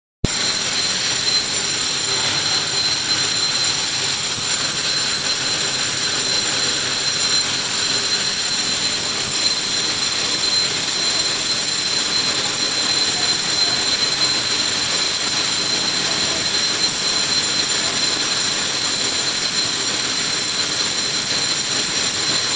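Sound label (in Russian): звук перфоратора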